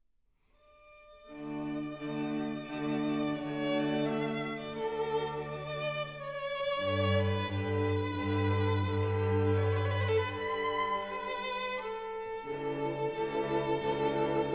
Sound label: Quintet D minor Cantabile; Quintet No1; G445